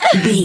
voice female hurt pain mild

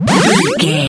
retro powerup collect

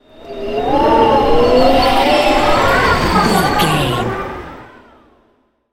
wind blizzard storm spell airy

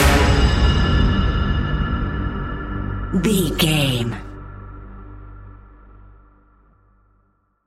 suspenseful echoes resonate stinger

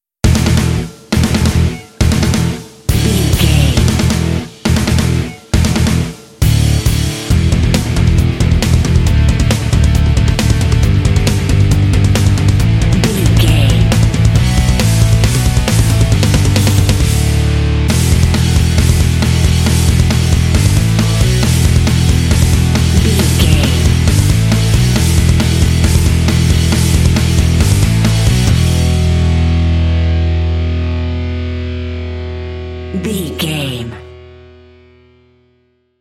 heavy smashing beasts

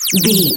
retro bird critter call squawk

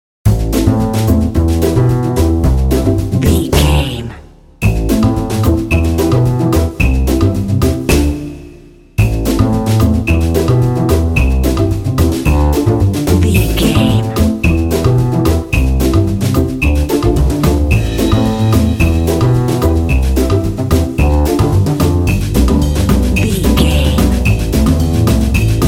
playful jazzy dramedy

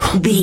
voice male breath quick

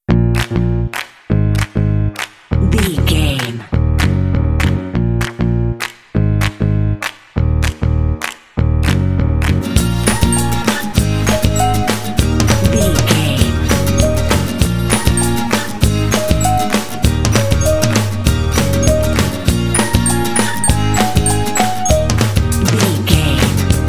happy indie claps cutie

